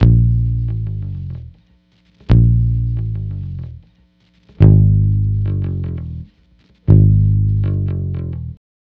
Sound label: Multi Guitar Bossa Bass-A#, 105; Zero-G Kocktail Kollection; Construction Kitz (Lounge)